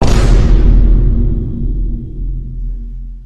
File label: vine boom